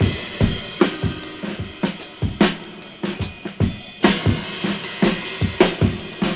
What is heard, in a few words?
drums, Drum loops Boombap